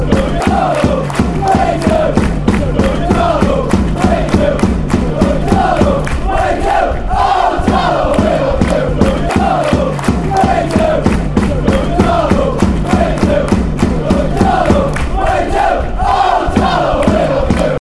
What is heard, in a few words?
we love you darlo fanchants